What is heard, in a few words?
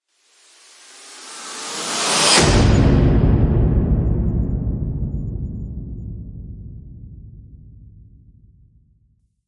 Noise Rip Impact; Gothic Storm Music; Brain Warp